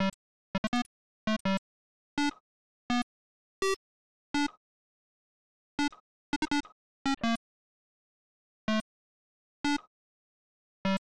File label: Explosion (Inverted); Mario Paint